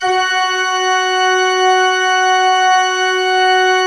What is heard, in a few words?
F3, Cathedral Organ, MANUAL PLENO; Propeller Island